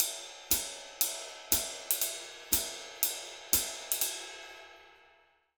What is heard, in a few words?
RID, Jazzistic, 120; Univers Sons